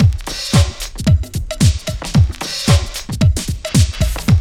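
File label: drum loop; X-Static Goldmine 3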